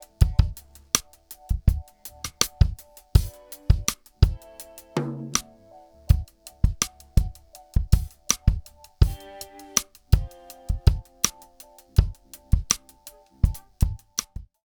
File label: Chandler Zener Limiter TG12413 DRUMS Comp1